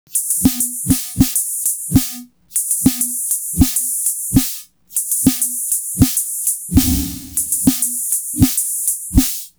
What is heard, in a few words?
Structured Rhythm, IDM Rhythms